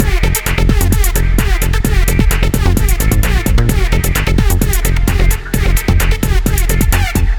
Boogie Bomb Loop

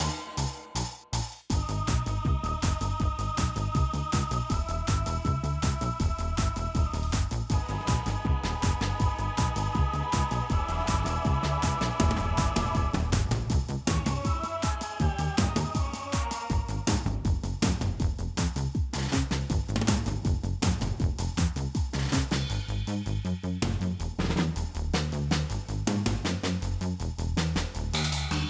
Generic Fight Med; Plants vs. Zombies Battle for Neighborville

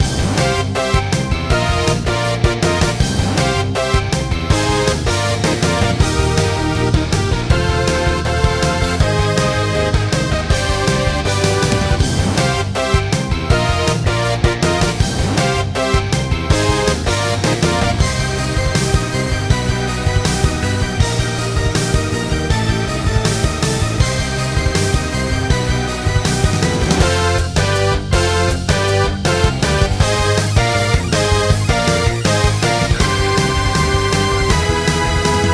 Clawcrane BGM

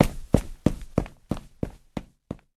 Run floor

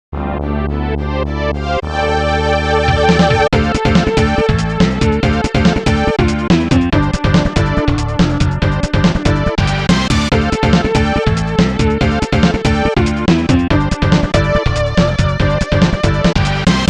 Japanese Style Room; Stage 5 - Command Hall; Ninja Warriors trilogy album

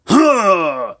battle leap; Hollow Knight; Zote

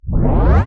Tape Start

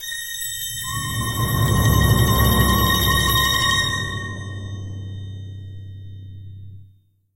Stinger suspense; Back to the Future: The Game; Ep. 1 It's About Time

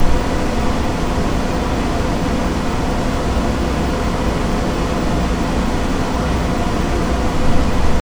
Offsite Ambience

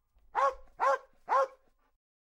Dogs

